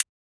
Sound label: Hihat